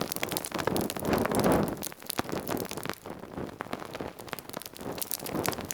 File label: Temple Pillar Fire Loop; Warframe; Orokin Derelict; Red Veil Temple